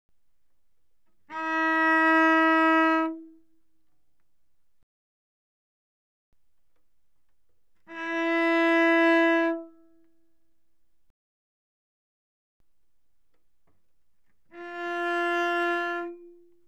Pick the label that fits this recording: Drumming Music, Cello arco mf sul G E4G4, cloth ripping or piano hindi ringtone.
Cello arco mf sul G E4G4